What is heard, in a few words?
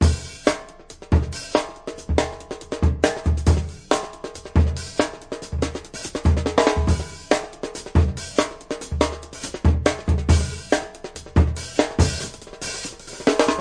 140 bpm, drum loops and breaks; 131 to 140 bpm; samples-ghost